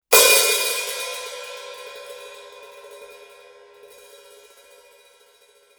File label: 14″ AA Medium Hats single